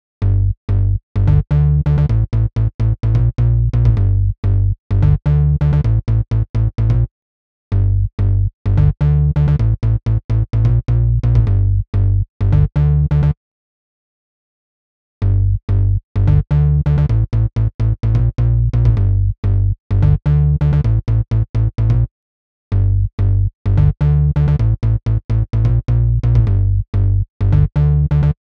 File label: 128 BPM Bass NoSC, G; Vengeance; Essential Clubsounds Vol.5; Demo Constr Kits; Slide Kit; Bassline